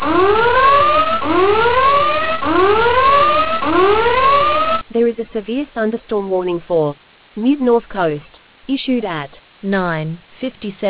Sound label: storm audio